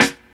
90s Good Hip-Hop Acoustic Snare C# Key, Royality free snare sound tuned to the C# note; snare drum samples